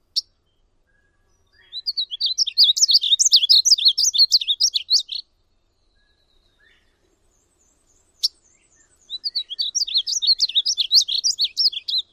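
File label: painted bunting male chips and song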